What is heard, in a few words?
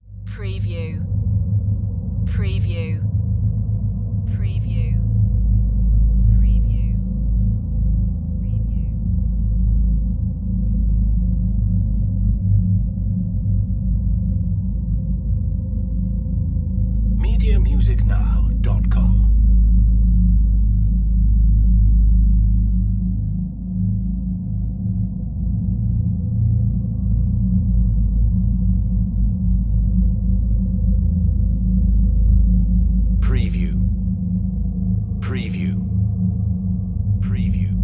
Drone Demonic Low; Sound Effects Bible